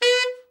TENOR SN, Sax; TENOR SHORT; Giga Samples Collection

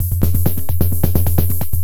Beat, Industrial

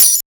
hi-percussion, techno; Techno ID; single-drums

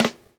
SN FLAM, DUB SNARES; Drumdrops In Dub VOL-1; SINGLE HITS

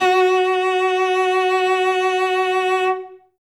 cello solo F#3; Best Service ProSamples vol.51 - Classic Orchestra 2

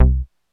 MOOG BASS K3, KAWAI; Rubber Chicken Software Sample Bank

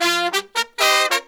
132, Pop & Funk Brass; Best Service ProSamples vol.25; AKAI

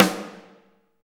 SD Real, Roland; Sound & Vision - Gigapack I

